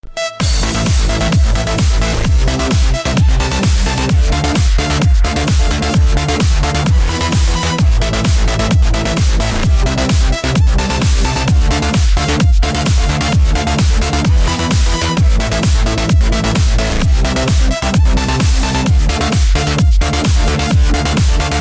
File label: Clubbing Mix